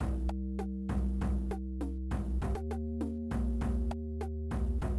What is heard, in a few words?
scary; jungle-book